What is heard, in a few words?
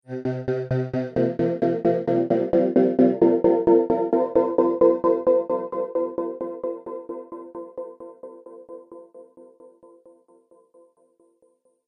Musical Stutter Drone; Musical Sound Effects